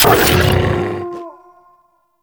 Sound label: Quad Sniper Mech; Warframe; Weapons; Tenno